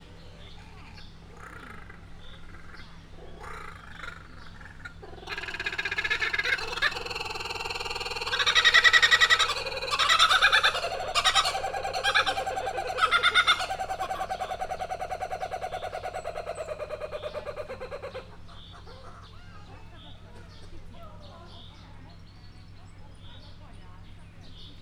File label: duo, kookaburra; miskolc zoo